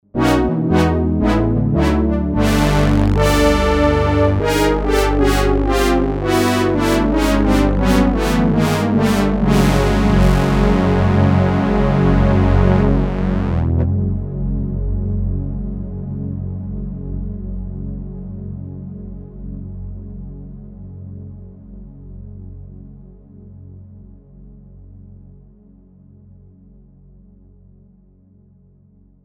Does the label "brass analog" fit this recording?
Yes